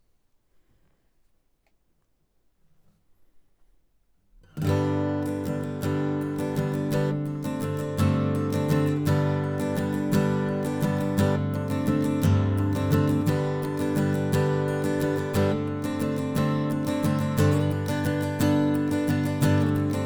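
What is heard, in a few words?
Acoustic Guitar Stroke Most Bridge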